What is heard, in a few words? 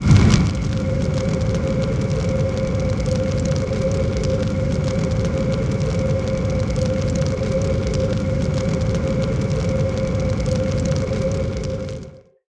Anger Fire